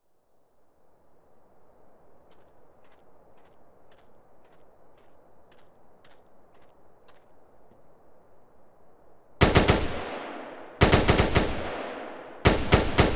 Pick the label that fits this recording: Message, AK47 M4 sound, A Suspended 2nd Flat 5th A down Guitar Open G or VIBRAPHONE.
AK47 M4 sound